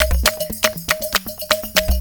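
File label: 120 COMBO, drum; Best Service Advanced Media Trax Modern Composer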